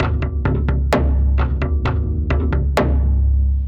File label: Cello Impacts 130 bpm; MIDI Clips; Drums; Ableton; Mood Reel